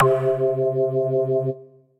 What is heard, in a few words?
Jazz Organ, Piano & Keys; Ableton; Skitter and Step